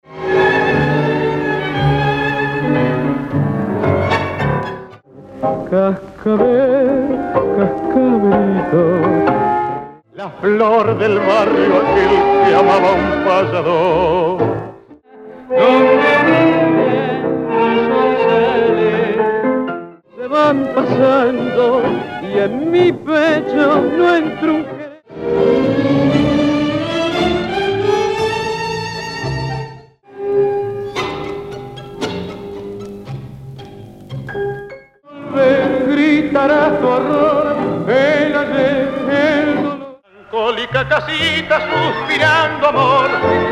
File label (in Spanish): tango